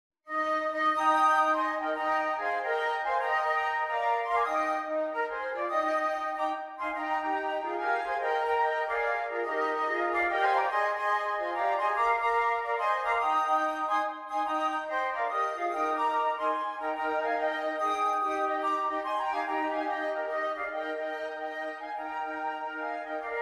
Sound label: Joy To The World Jazz